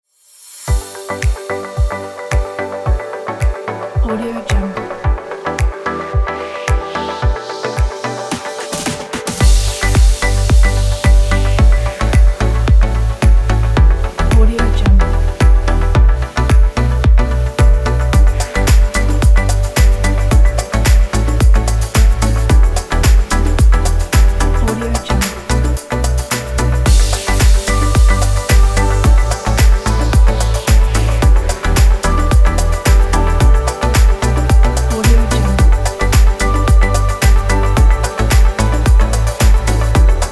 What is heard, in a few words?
tropical summer house; selectedsounds